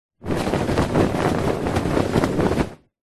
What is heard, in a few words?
foley clothing shirt shake